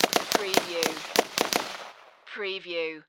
Machine Gun Colt AR-15 Long Burst Random Fire Distant Range